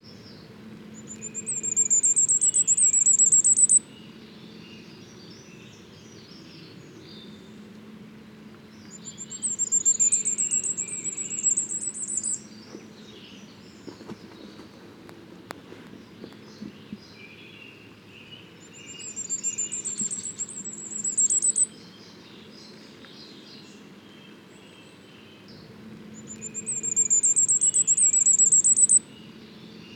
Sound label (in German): Sommergoldhaehnchen; Das Sommergoldhähnchen gehört zu den kleinsten einheimischen Vögeln.